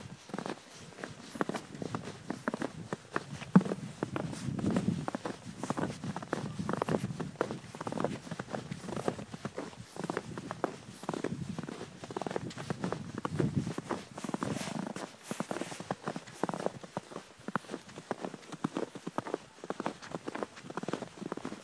Walking in crunchy snow

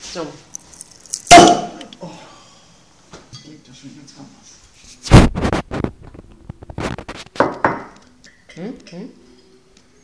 plop

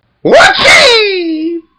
achievement warcry

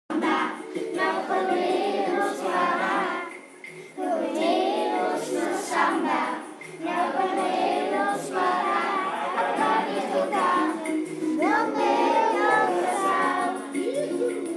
samba song

